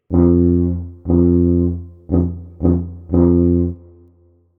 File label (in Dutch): tuba ritme